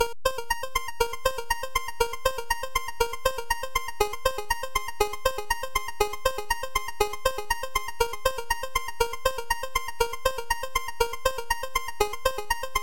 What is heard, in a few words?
Retro Intro; Finding Teddy 2